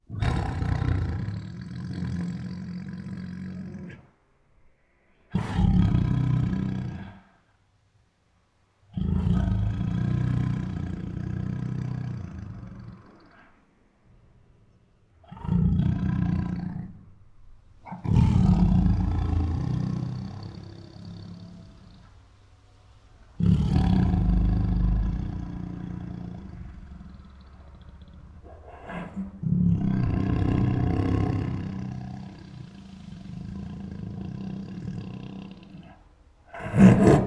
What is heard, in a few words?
Female Tiger Makes Many Protective Growls; Tigers; Sound Ideas